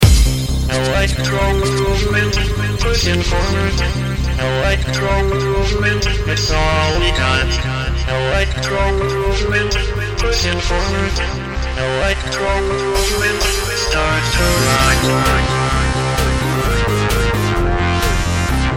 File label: Electro Movement Vocal Club Mix